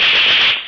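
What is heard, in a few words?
machine gun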